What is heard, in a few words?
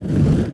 Spining Hooks; Playable Tutorial Level; TR3